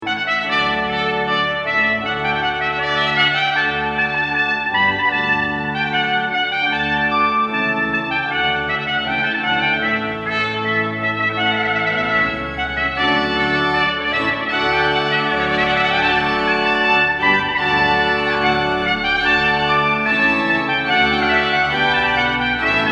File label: rondeau trumpet organ